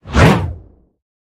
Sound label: shell whiz; War Thunder